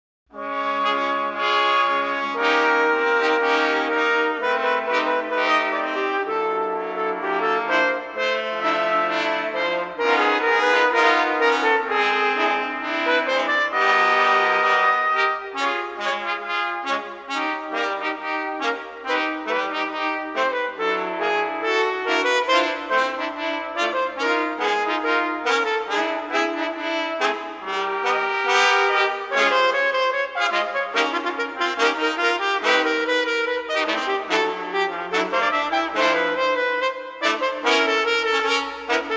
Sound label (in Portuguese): Peca para 5 trompetes